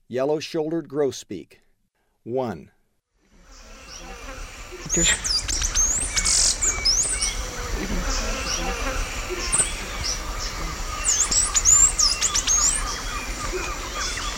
Yellow-shouldered Grosbeak; S. America; Eastern Lowlands

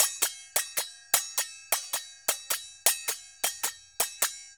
Percussion, Indian

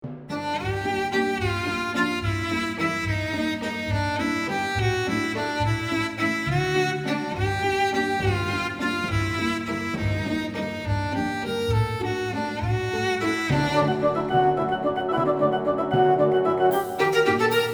Violin Hook; Danse Macabre

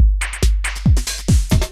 techno 140

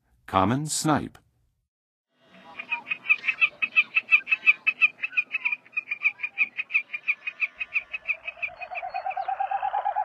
Common Snipe, Birds of North America; Western USA; Western USA Songbirds

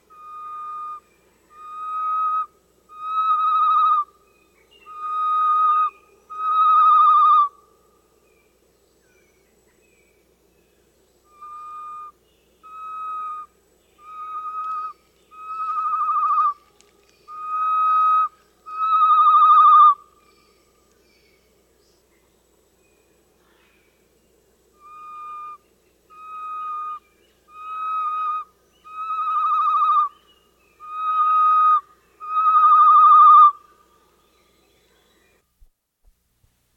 Great Tinamou; S. America

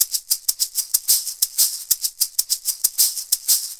Perc, Percussion Latin

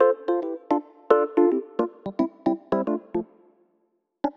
Rhodes; Rock; Keys

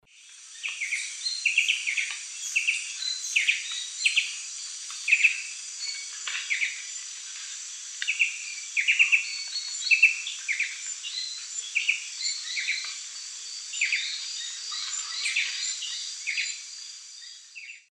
Herpsilochmus dugandi, calls; S. America; Eastern Lowlands

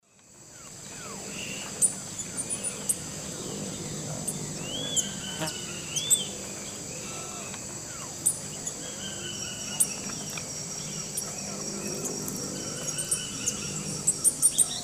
Tangara velia, calls and song; S. America; Eastern Lowlands

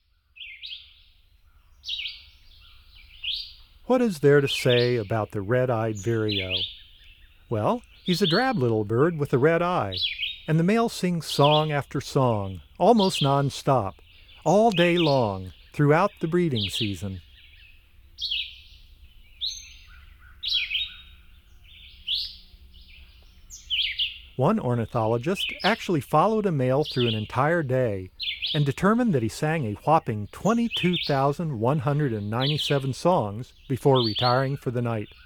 Red-eyed Vireo, Bird Sounds; North America; Common Western Species; Know Your Bird Sounds

